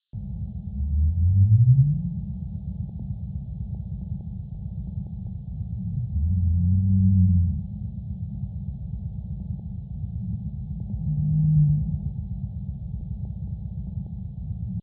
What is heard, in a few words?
bowhead usual calls; baleen whales